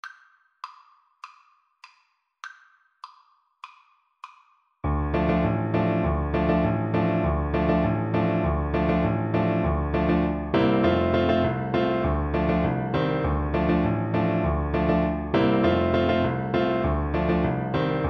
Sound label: cello